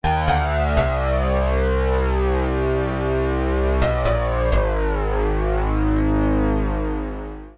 synthex laser harp

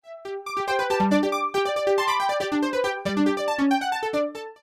d-50; Analog Chop

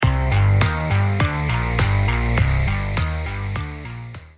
moog 55

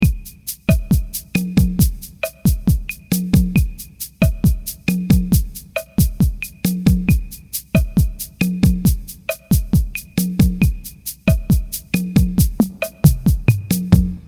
korg i4s rhythm; Drum; 1995

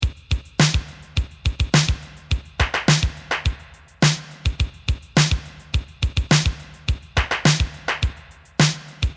linndrum; Sound demo

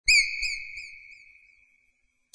arp 2600 seagull; Seagull (by Johan Schurer)

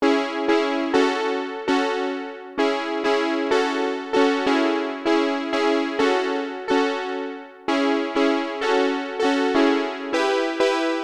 ob-xa

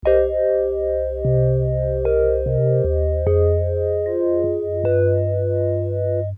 memorymoog bell pad

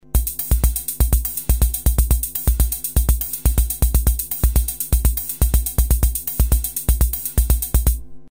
kr55

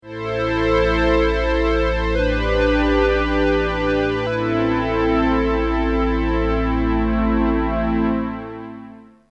memorymoog string

